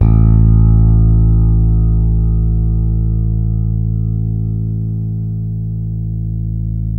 6-STR FRETLS; Spectrasonics; Bass Legends

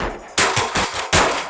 LOOP L, Drum & Bass 160 BPM

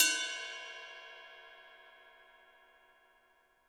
HiHat & Crash, Roland TD-20; HiHats

